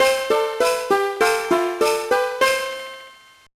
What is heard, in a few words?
All Candies Collected; The Polar Express; GBA